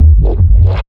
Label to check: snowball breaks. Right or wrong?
Wrong